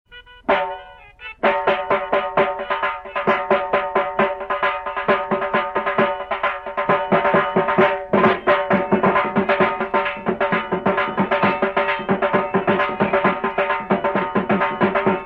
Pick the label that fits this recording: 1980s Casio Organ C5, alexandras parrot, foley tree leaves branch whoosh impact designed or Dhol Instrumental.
Dhol Instrumental